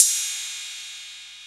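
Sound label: ride cymbal; E-MU Formula 4000 Series Vol. 2; OrbitPercMaster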